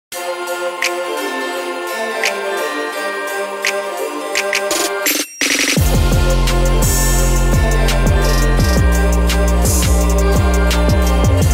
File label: Trap Remix Marimba; Christmas Vacation